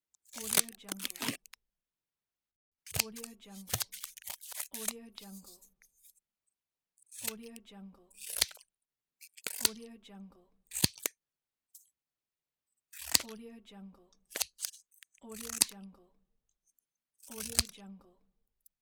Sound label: cutting paper scissors